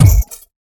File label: hit Box; ball rolling game